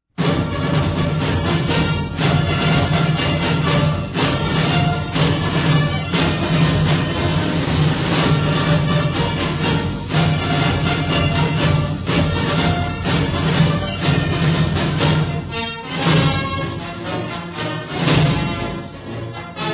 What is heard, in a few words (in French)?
Marche Alsace-Lorraine; FRA WAR